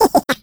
skelly small; Garry's Mod; tf2; halloween; skeletons

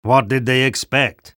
spy cheers

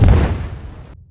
strider step; Garry's Mod

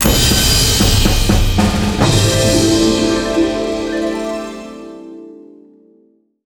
rank one achieved

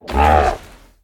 jump, procoptodon; zoochosis pack1

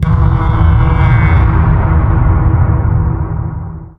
mysterious perc, halloween ambient; Garry's Mod; tf2

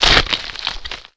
bite; poppy; worm pack

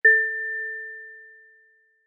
step; xylosaurus cursed

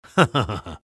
laugh short; spy

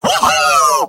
scout battle cry; vo; tf2; mvm

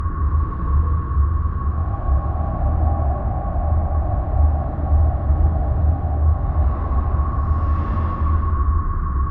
underground wind lp, ambient; Garry's Mod; tf2

